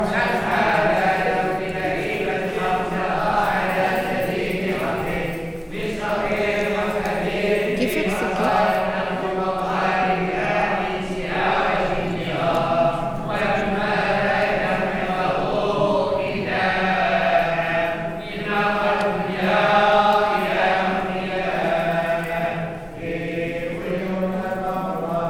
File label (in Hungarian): mauzóleum ének a Koránból távolról